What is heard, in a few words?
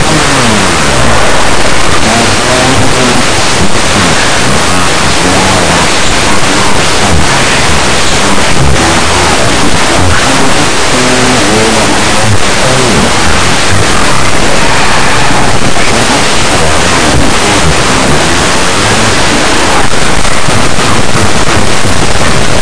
club